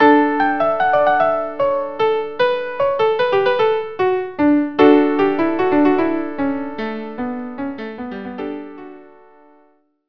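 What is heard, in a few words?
Melody violin violin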